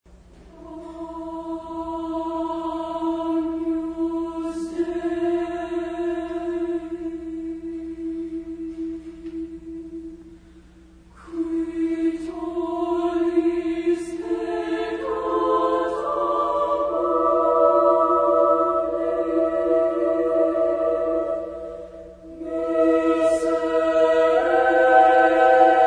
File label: Mass for Double Choir Agnus Dei; Clausen